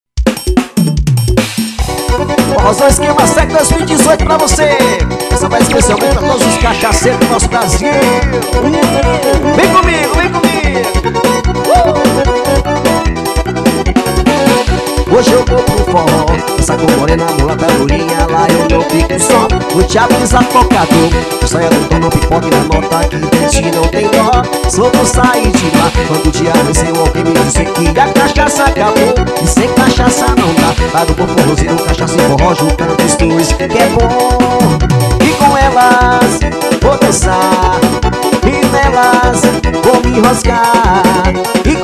tocando todos os ritmos

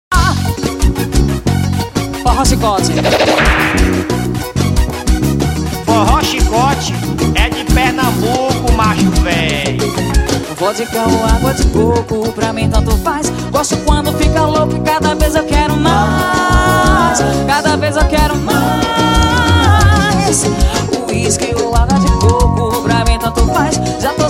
forro

